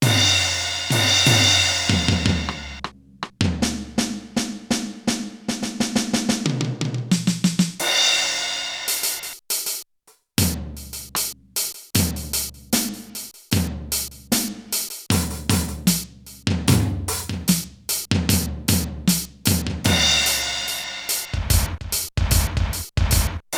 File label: Drum Set; Yamaha TX16W; Percussion